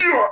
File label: pain; cooler